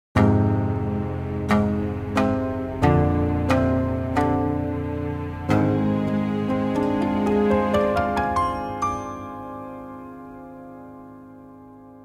Folksong; B Path of the Teal Lotus

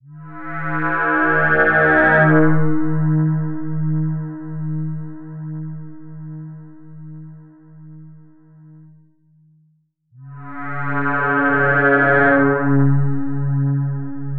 synthy ambient

